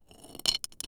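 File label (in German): Stein der über Stein schab, stone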